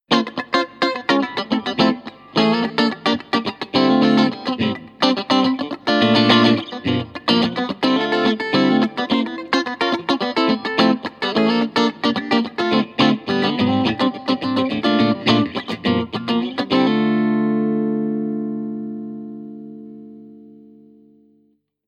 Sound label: funk; Une hybride, un corps de telecaster avec des micros Strato